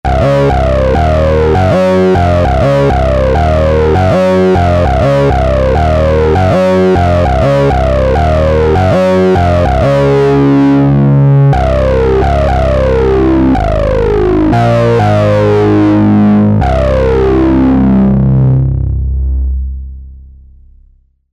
Distorted Sqr

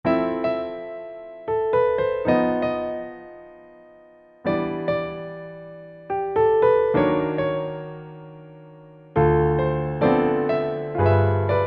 Grand

